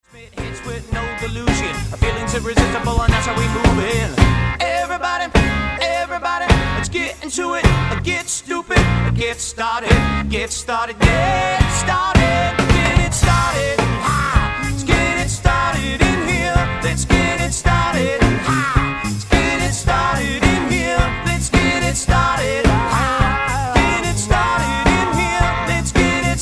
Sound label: hiphop; smashradio; power trios